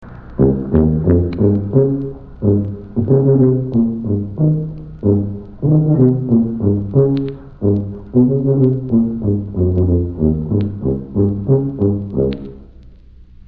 Tuba

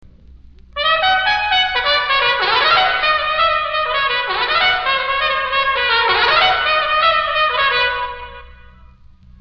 trumpet

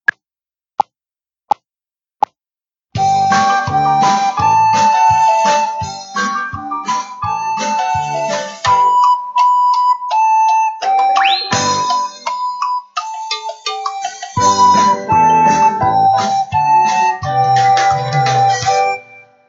Echoes Duet

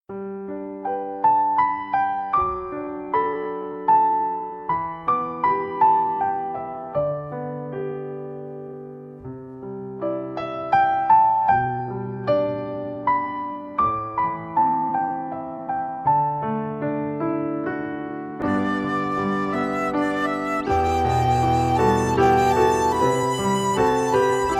Arirang accompaniment (slower)

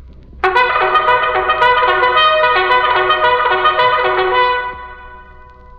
bugle

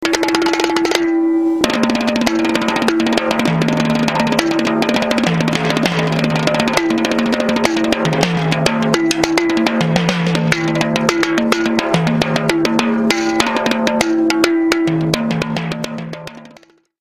rototom; percussion; drums

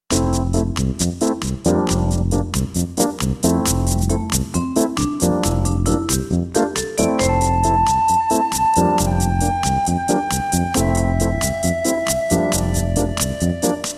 Ocean Breeze (melody)(slower)

